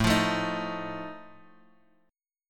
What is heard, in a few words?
D sharp Suspended 2nd Flat 5th, chord